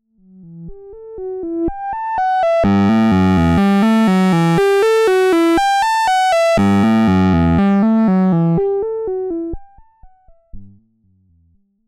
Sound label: vcf1 Drive LP sweep Gain 50